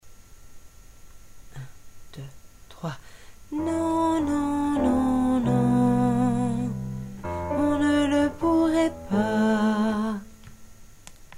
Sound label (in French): alto